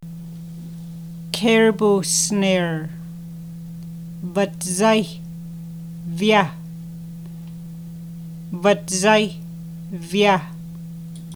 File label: caribou snare; gwichin